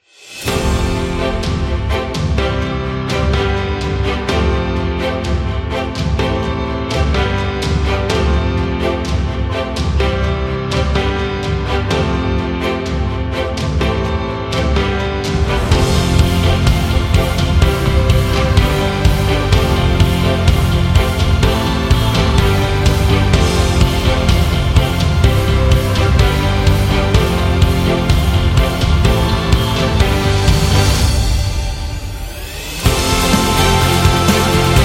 Motivational; epic music vol 3

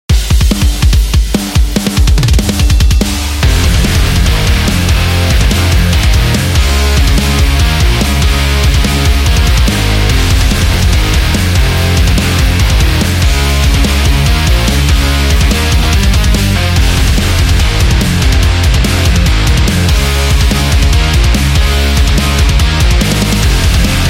Metal Guitar

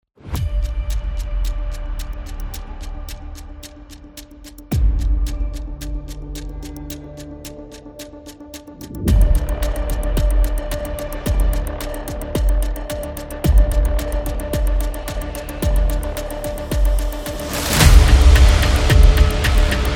Thriller Countdown

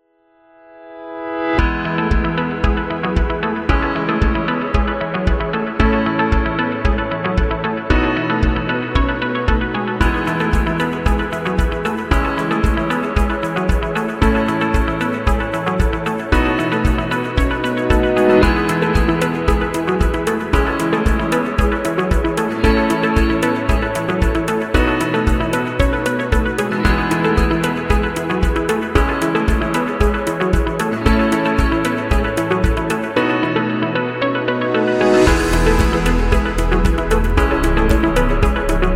Beauty Commercial

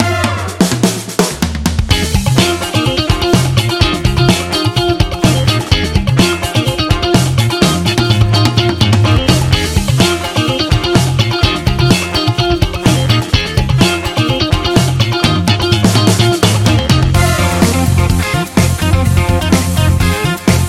Funk Beat; casino

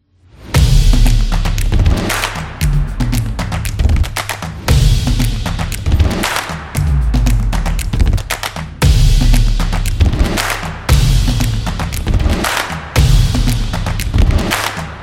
Event Music

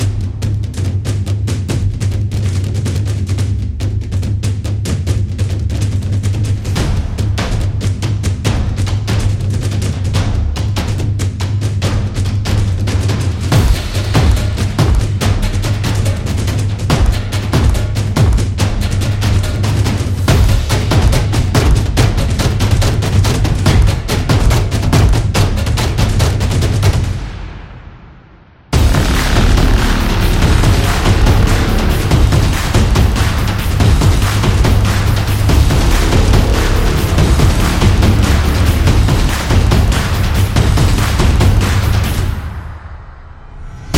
Sport Big Beat

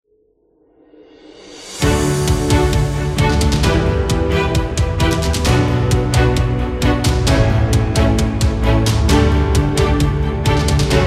Victorious; waiting music

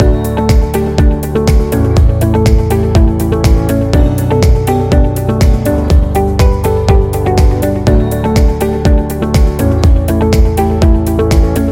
Corporate Loop Upbeat Presentation

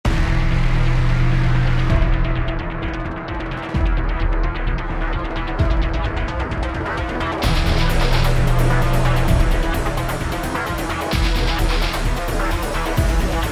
Epic Cyber Rock Industrial Metal

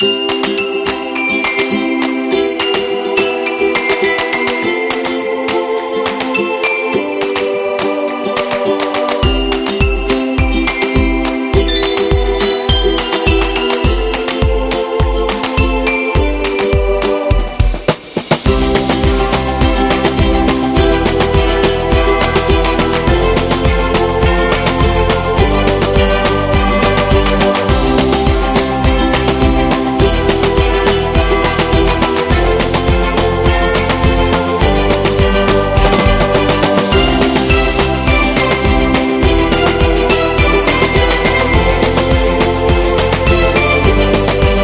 Happy Christmas; snow

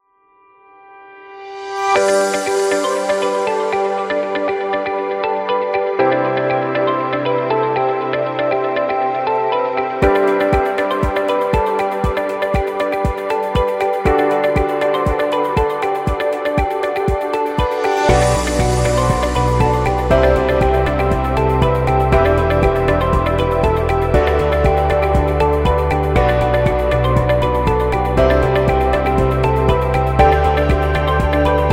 Beat Promo

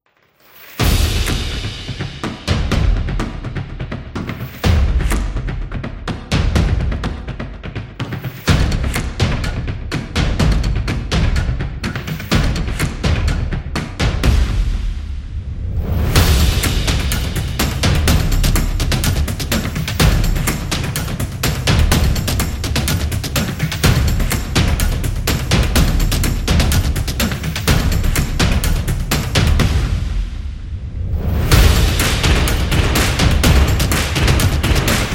Stomps Action; drums trailer

